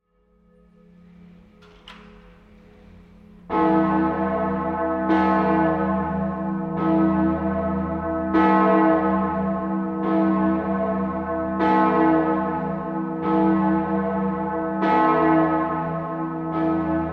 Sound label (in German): Herz Jesu Glocke, glockenklang; Jesuitenkirche Innsbruck